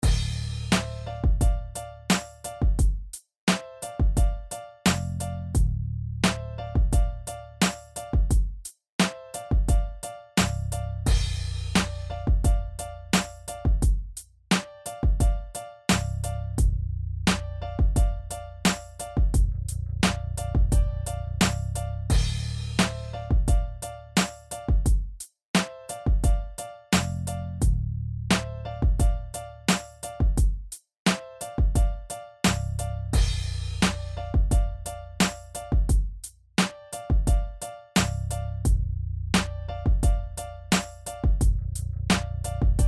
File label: Gangsta Rap